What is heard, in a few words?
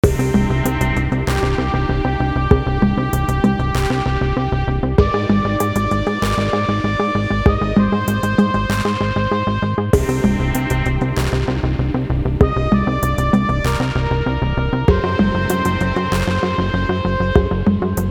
victory is here